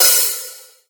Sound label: Hi Hat Open; pitchglitch samples; BlakenGouda